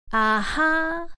diva fem vox ahah

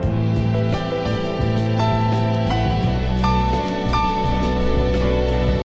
085 amazing piano hook; SAMPLED MUSIC LOOPS

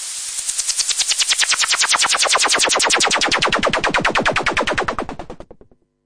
Yamaha CS5, Noise Sweeps

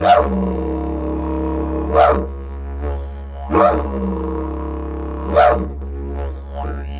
137 didjeridoo growl bark; WORLD LOOPS

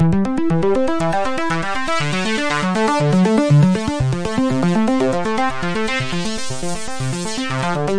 120 happy filter arpeggio dry

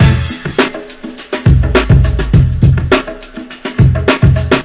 103, drum loops

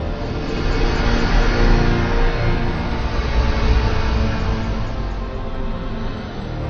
143 lush noise melody; SAMPLED MUSIC LOOPS

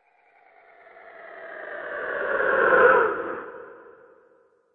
come down fx, sweeps and fx; Ze Franks Pain Pack

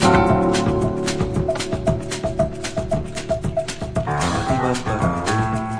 114 clav funk vox 2 bars 3 beats; SAMPLED MUSIC LOOPS

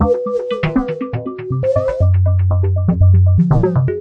120 pretty ping pong synthbass

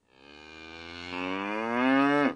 moo, Cow; Cow Construction Kit

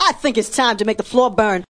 MALE SINGING

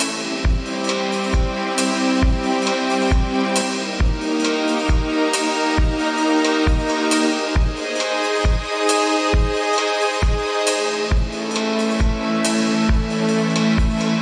135 huffy pads offbeat kicks n hats; SAMPLED MUSIC LOOPS

